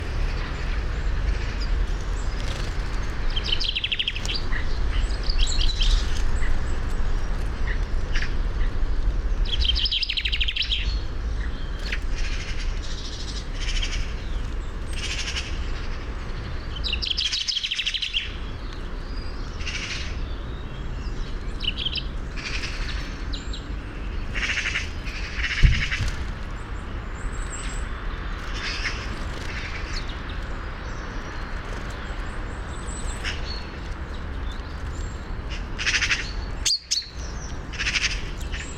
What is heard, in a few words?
Fringilla coelebs; SETE